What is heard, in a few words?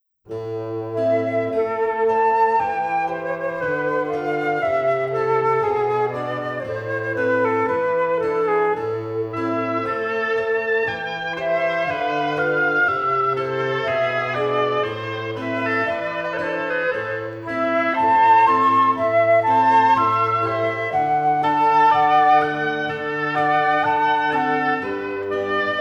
Quartet in A; Telemann; TWV42-a2